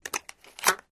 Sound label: Rifle Cock Clicks, mvmt